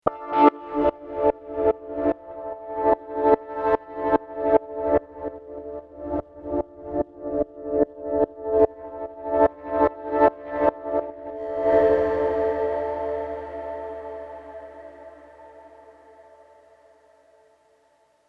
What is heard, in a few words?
Loop mod pad; Cameleon Labs 7721